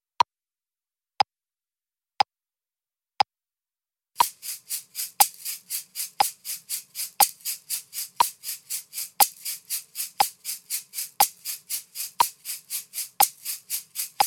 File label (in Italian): Sedicesimi con accenti, shakers